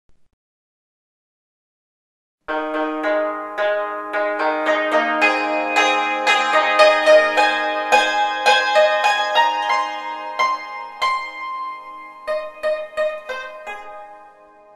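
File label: hymns and medleys on chinese hammered dulcimer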